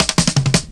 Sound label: Rim Shott Cut, Drum Loops 166.5; Zero-G Jungle Warfare 1, 2 & 3